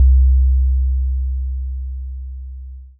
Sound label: quiet thud